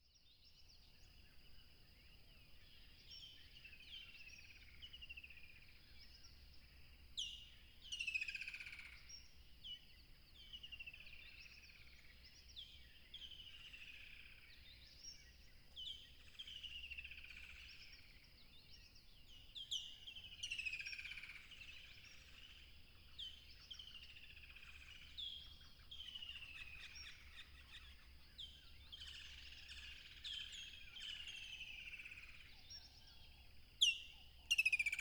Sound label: tropical river crickets chirping